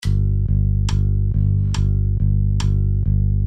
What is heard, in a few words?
Bass Tabs